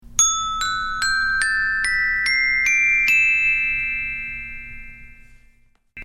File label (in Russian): звук металлофона